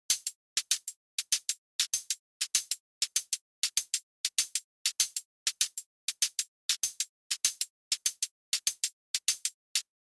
hat

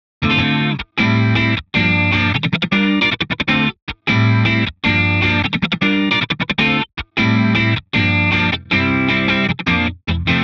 auto Wah

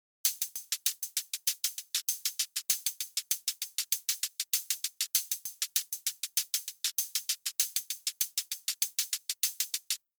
hat

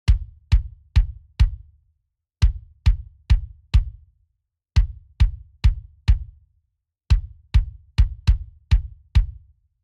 smack Attack Sustain On

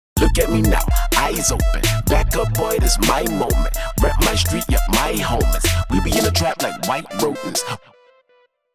Harmony on

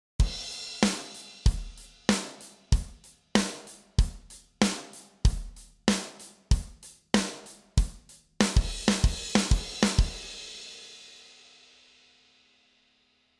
drum On

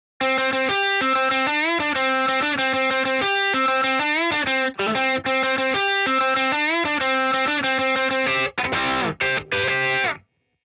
lead delay off

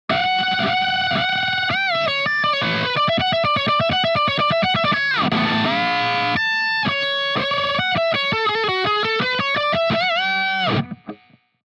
guitar wah off

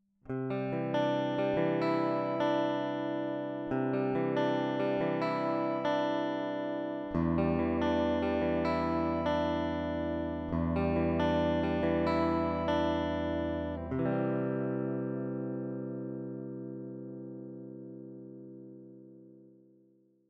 guitar clean off

